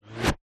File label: bullet wizz incoming right centre airy; Skyclad Sound